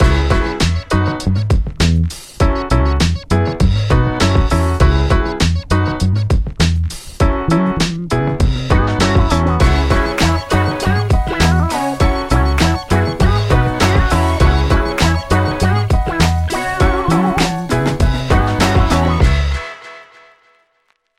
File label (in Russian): muzykalnyaya otbivka